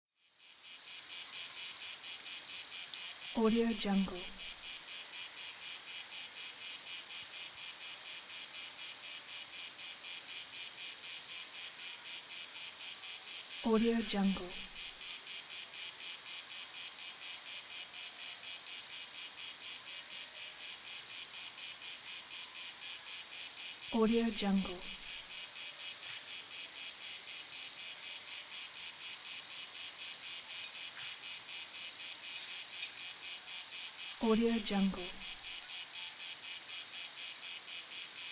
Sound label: insects